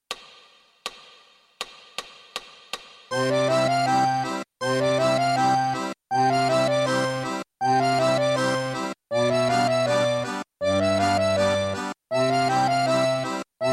Sedicesimi Fisa Tango a Ottavi

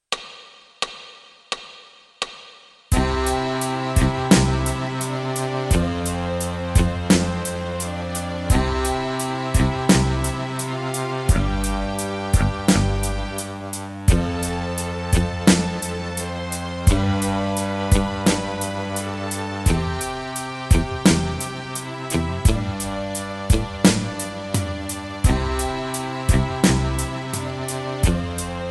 I Primi Bassi (Fisa tutti)

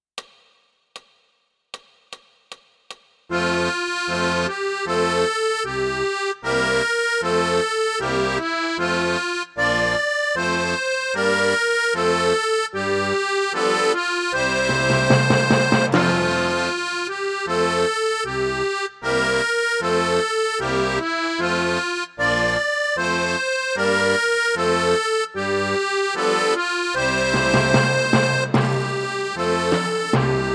Inno Germania (Fisarmonica)

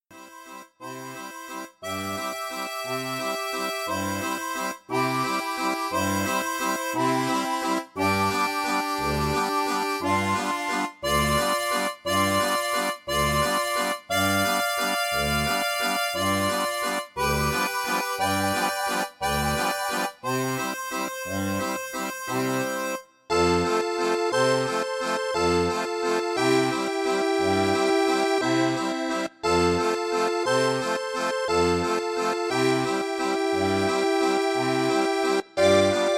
valzer; Czerny

